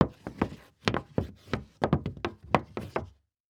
Foley Footstep Cowboy Boots Stumble On Hollow Wood Surface; Foley Footsteps